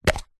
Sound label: household shaving foam can plastic lid pop off